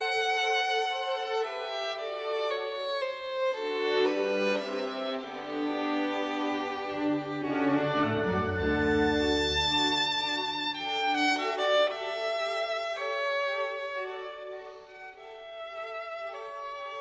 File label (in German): violinkonzert nr 2 d dur kv 211 andante